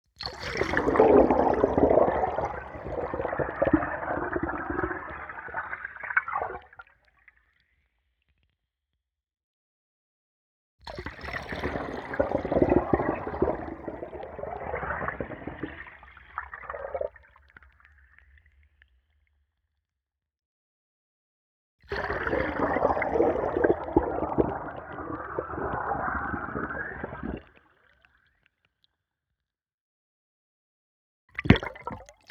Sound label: Fill Up Long Hard Underwater Single